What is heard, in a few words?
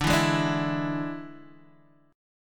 D Suspended 2nd Flat 5th D, Guitar, Open D